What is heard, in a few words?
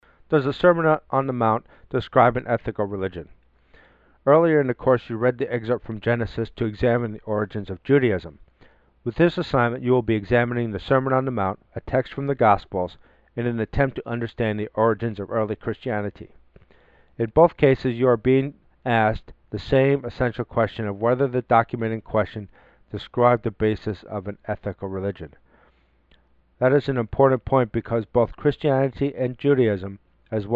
Gospel